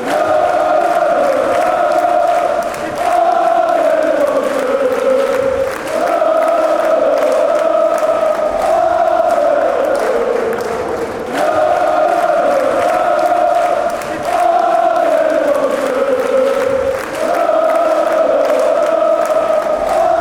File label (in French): allez lokeren, chants